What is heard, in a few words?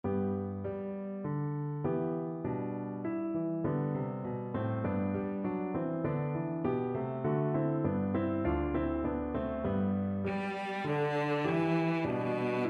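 violoncello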